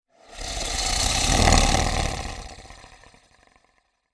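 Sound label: Zombie; Clam; Blind Survivor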